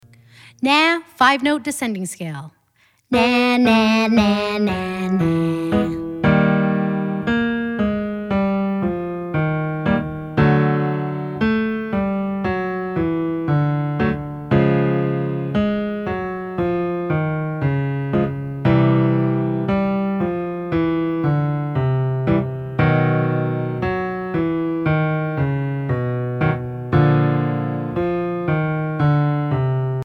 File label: nah 5 des middle range, vocal exercises